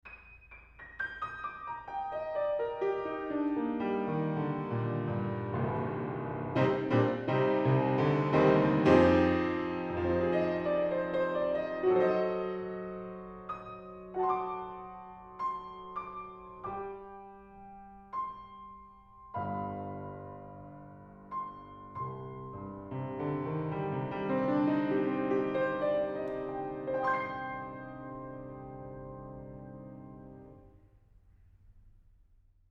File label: Piano, Distance 325cm Mic